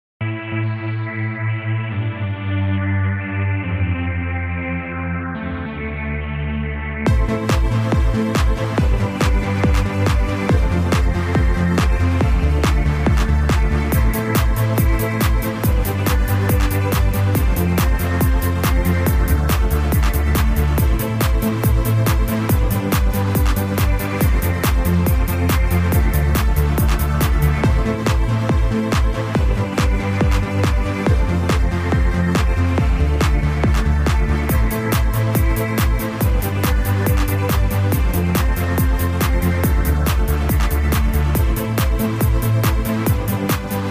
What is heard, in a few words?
SYNTH